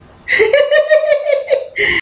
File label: hihihi